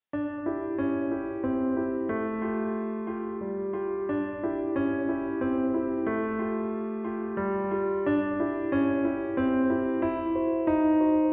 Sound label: Piano waltz; Delius